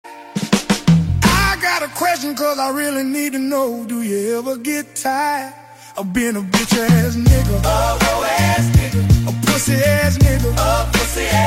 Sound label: tired